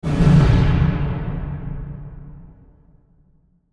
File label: Sci Fi Explosion Spaceship; Spacecraft; Blastwave